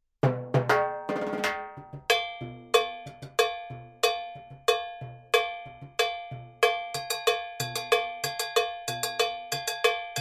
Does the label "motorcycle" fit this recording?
No